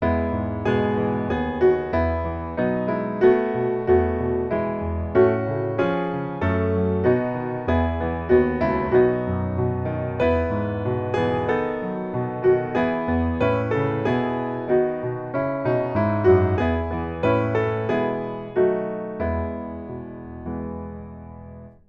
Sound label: piano